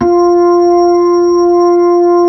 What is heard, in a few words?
B-3, Brite; Brite Xfade